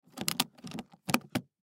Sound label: Make Up Case Close, Make Up Case Close Latches Shut; Foley; Objects; Blastwave